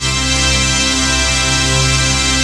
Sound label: PAD